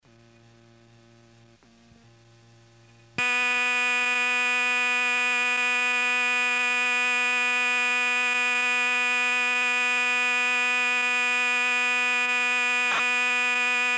Kawai SX-240 presets; waveforms

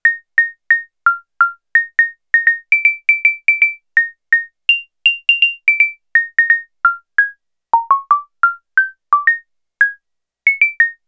CLAVES, Roland SH-101; Factory Patches

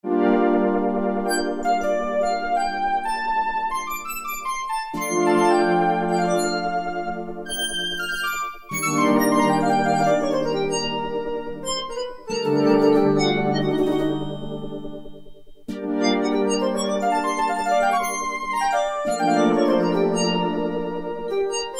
ETHVELOSPLIT, Casio VZ-10M; Internal factory combi

